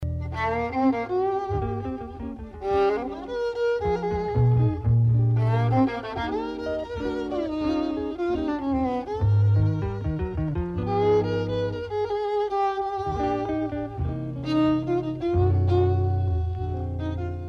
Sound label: jazz and violin